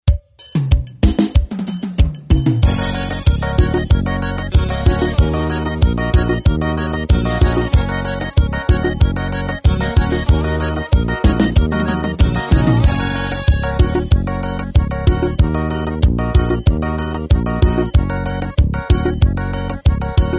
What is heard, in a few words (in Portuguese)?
forra perfeito